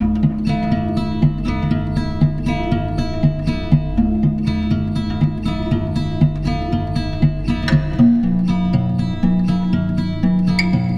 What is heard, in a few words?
weird guitar 120